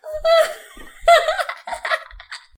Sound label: giggles and laughter; SyrinScape